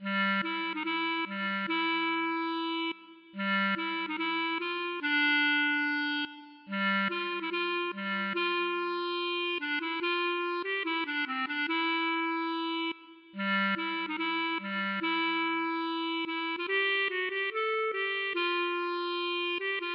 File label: klezmer